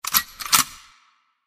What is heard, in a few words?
Gun Cocked Pumped